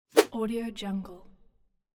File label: ninja swish